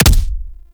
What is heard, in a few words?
Bayonet Punch; Warframe; Tenno